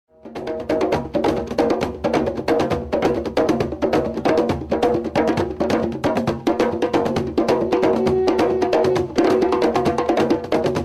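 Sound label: Zulu WAR Drummers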